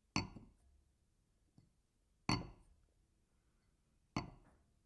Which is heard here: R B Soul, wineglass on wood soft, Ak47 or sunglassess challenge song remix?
wineglass on wood soft